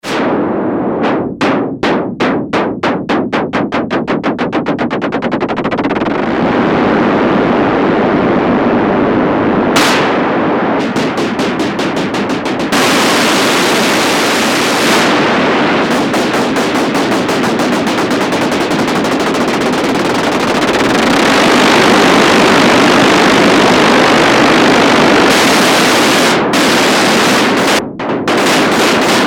START ENGINES, Oberheim OB-8; Factory Patches

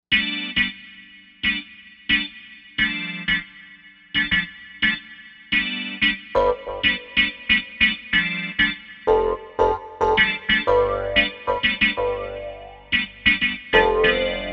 Res-Synh2, Korg M1; Factory Patches

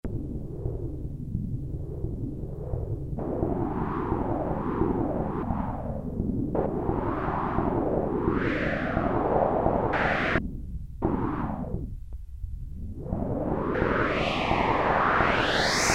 Noise Sweep, Prophet-5; Sequential Circuits Prophet-5 (Ver.3); Factory Patch

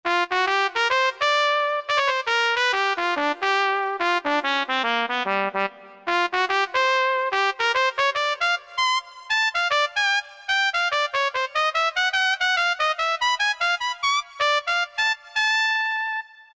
Trumpet, Korg M1; Factory preset examples

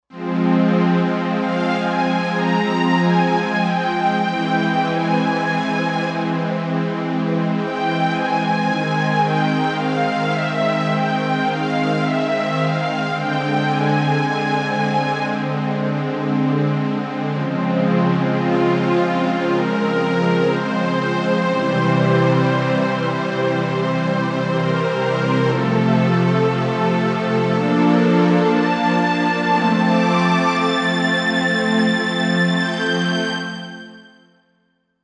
Analog Strings, Korg Wavestation A-D

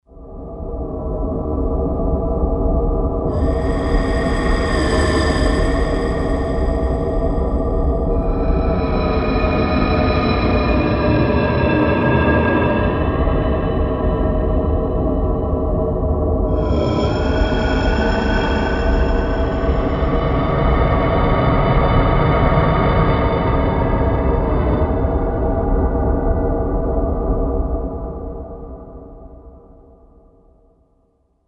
Dark Air, Vintage Synth; Roland SR-JV80-04

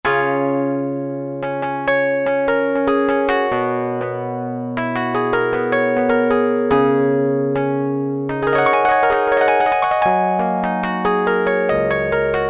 E. Piano, Electric Piano, Korg DSS-1; Electric Piano 2

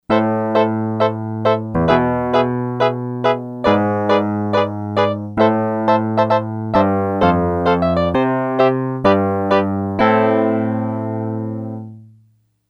Uprt piano, Yamaha DX100; Factory Patches

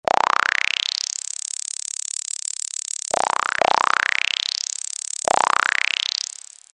HPF Up Sweep; House; Roland SR-JV80-19 House Collection

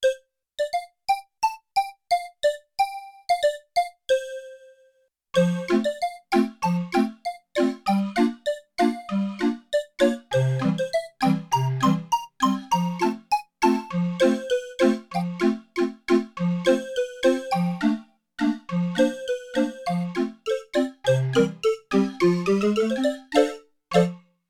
Calliope, Yamaha TX16W; Combo Performance 2

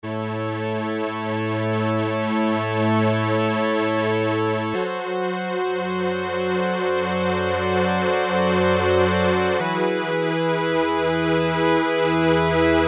SLOW STRINGS, Oberheim OB-8; Factory Patches

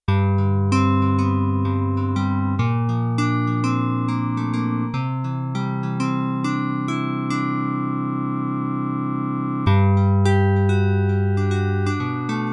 CHORUS GUITAR; Yamaha TX16W; Jazz Performance

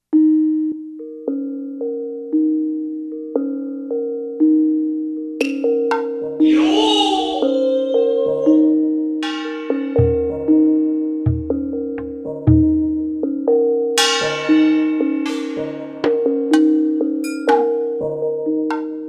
ASIA, Factory Rhythm Sets; Roland SC-880

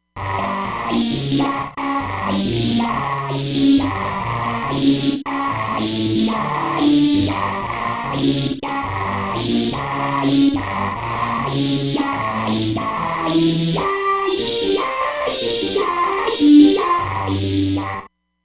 Roland Fantom XR

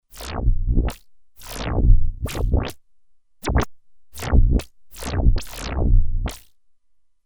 SpaceOops, Roland Alpha Juno-2; Factory 2

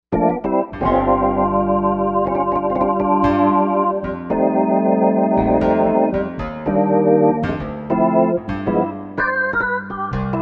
JazzHits, Korg M1Rex; Factory Patches

